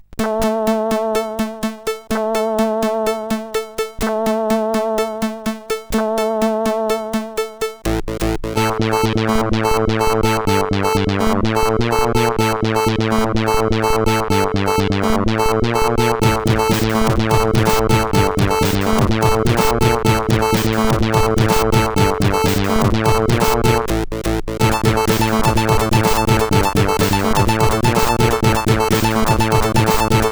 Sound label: Tech CSG8580R5